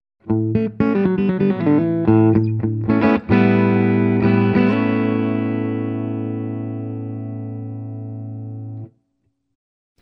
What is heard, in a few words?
clean